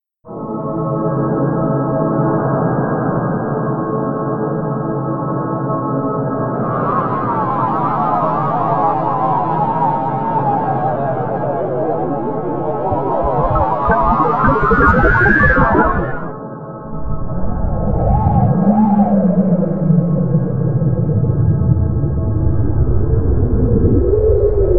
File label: virtual leaves rustling; kaj fawcus